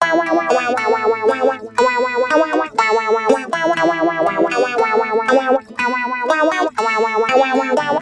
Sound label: ac gtr pigtronix